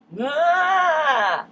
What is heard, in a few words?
ahhh